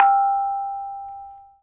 G5, GAMELAN SET; Spectrasonics; Heart of Asia

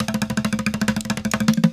Street Groove, Ambient Grooves

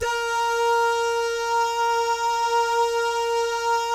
DAH, Vocal Jazz; ILIO

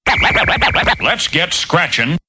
funk sample

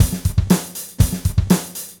Gretsch groove 90 BPM, 1967 Gretsch Kit drums

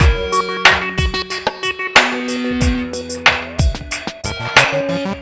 Beats, Synchro Funk; Finky Five; Sonic Foundry (Sony Creative Software)

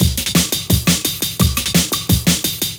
breaks; M8

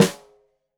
Snare Stereo, Drums; Deeply Sampled Drums

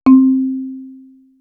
Vibe; Coldcut's Kleptomania VOL1

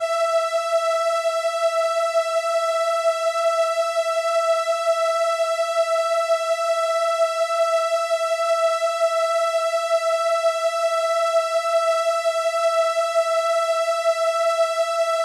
Viola E6, ARP Solina

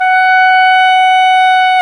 oboe, Oboe Brth; Roland LCDP04 Orchestral Winds; Oboe Brth 1

